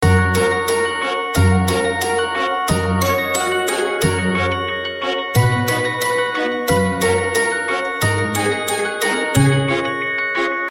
Tone Pitched

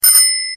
Bike Bell

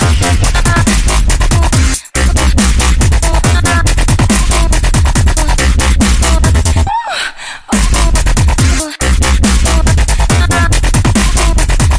Pornstep

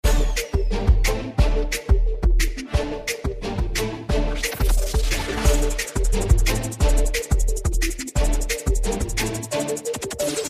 beat; ringtones

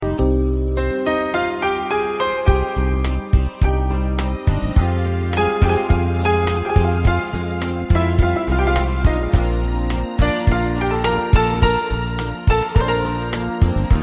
piano; classical

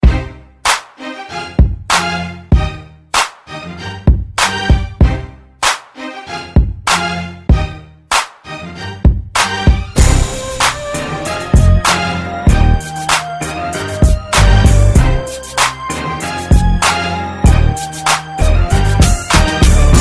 italian anthem

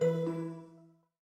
xp Alto Windows Logof

